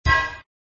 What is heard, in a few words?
Sound SFX, flipper